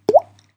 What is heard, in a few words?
incoming chat; Linphone